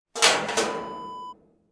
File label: water tank lid close